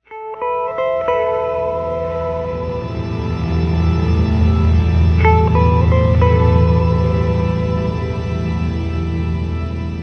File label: Link Sting